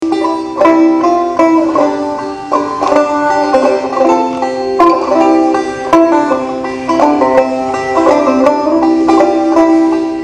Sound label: Waltz Banjo; Mississippi Fiddlers; Family and Field Recordings